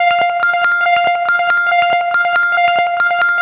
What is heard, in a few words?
Synth Key; Trip-Hop